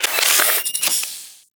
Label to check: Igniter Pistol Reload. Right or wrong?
Right